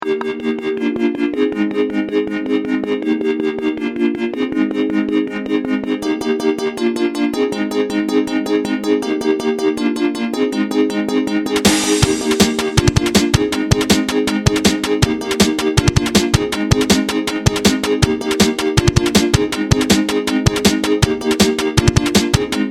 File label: instrumental